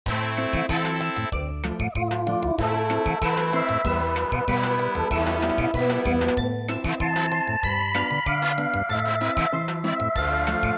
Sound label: Samba